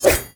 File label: Kunai Whoosh; Weapons